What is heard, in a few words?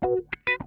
LICK, Funk Guitar; 90 s Sample CDs; Zero G